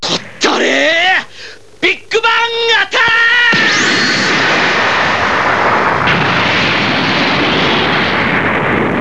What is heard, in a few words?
big bang attack